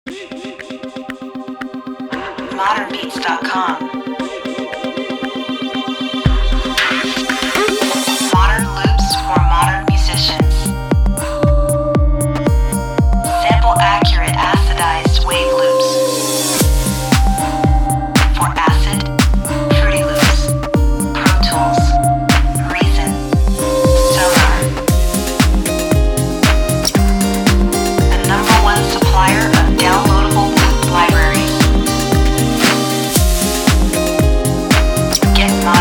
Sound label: Pop RnB Guitar Loops